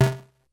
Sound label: Snare, Drum Machines, Korg DS-10